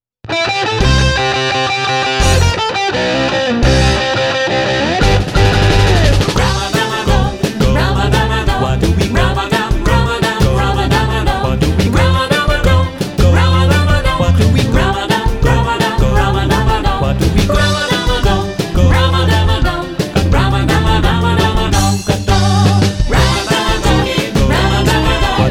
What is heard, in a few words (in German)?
RocknRoll mit voc